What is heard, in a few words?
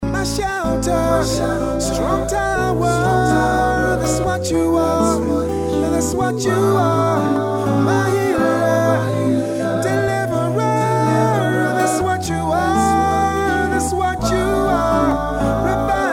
ring tone